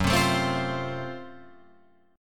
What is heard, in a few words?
Gb Minor Major 7th Flat 5th Gb Guitar Open F; strummed; 6,7,9,7,8,7